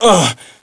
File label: pain, player